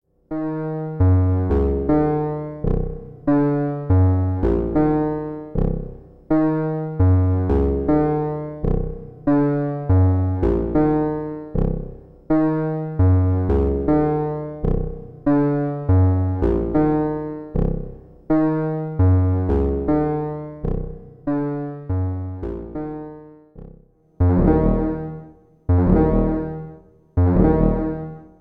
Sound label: 4 rhythms